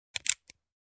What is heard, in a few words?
Magazine click